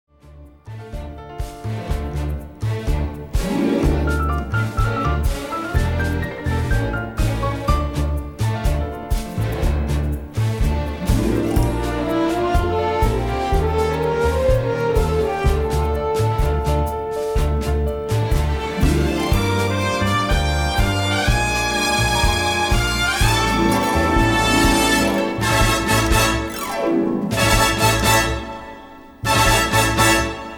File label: tango; Frasier